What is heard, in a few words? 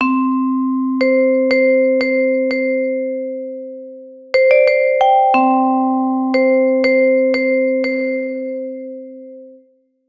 Vibraphone, mallet